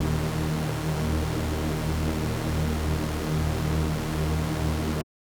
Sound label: SuperSaw D#3; SuperSaw1; KG MegaSaur; M8